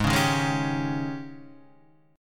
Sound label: Ab Major 7th Suspended 4th Ab down Guitar Half Step Down; AbM7sus4